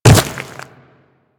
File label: Inf Quadruped Medium Land Dirt, Footsteps; Warframe; Infested